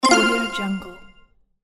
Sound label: puzzle sound